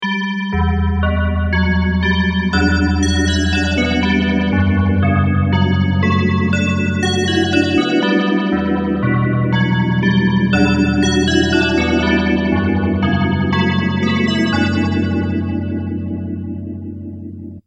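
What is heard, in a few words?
DX7 bells, phaser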